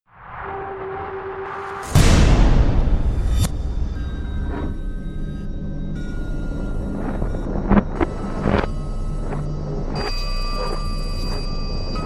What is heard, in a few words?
brace for impact